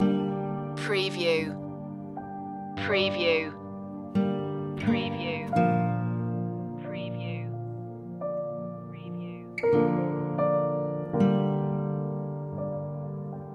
ambient singularity loop

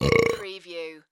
Burp Male; sound-effects-bible

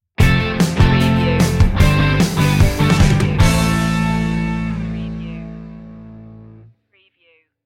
active stinger

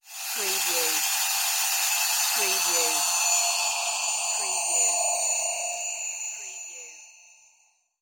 Teleport Materialize

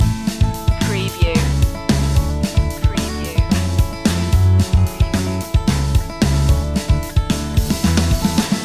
power pop goes bang loop

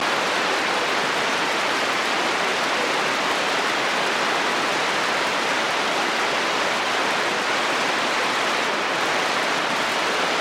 Rain Roof Steady

